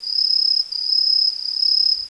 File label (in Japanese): aomatsumushi; insects